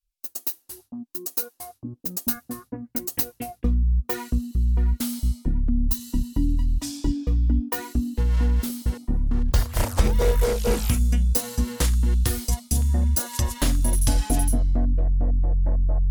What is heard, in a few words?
comedy electro